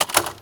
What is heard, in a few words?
accept; WhiteOut